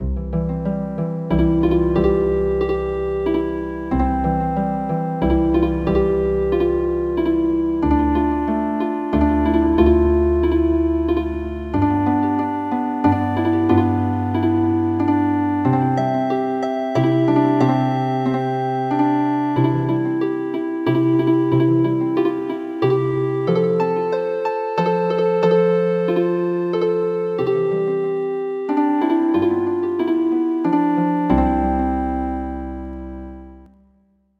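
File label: Healing Harp, traditional; My Baloon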